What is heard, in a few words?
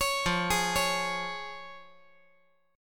F Minor Sharp 5th, down